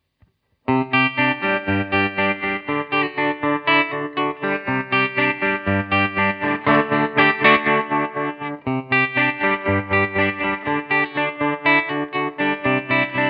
Clean Tremolo; One Control

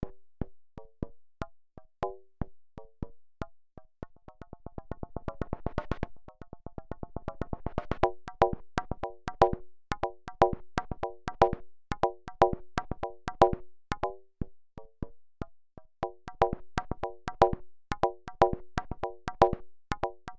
hand drums

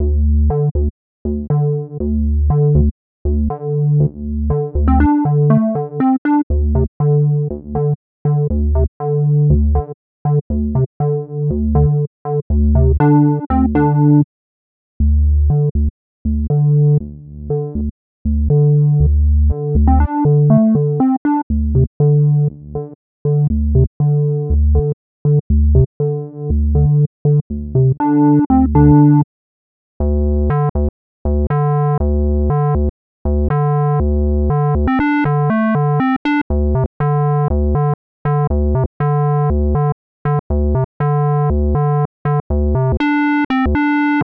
Jupiter 8 Hammer Lead

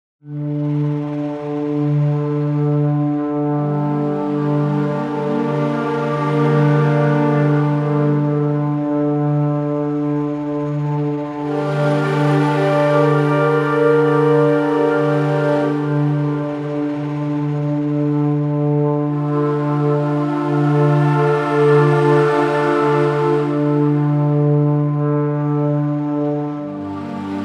Evolved Woodwind Designer AMB Fluttering By; VENTO

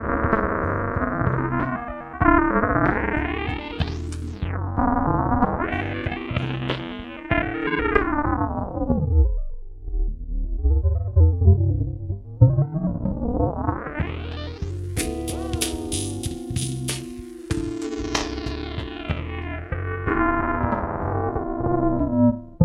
GRP Module 12dB SVF Sine FM

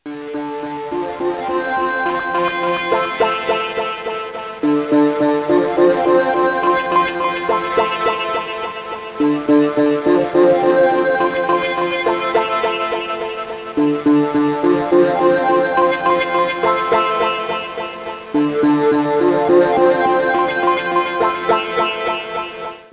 Modal Argon8

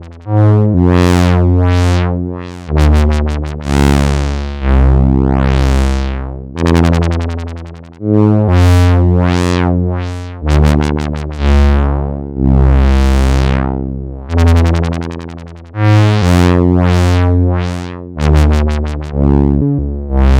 Voice Slow Sequence

